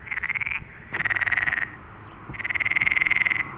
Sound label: cretensis, rana pelophylax; animals chordata salientia ranidae; eukaryotes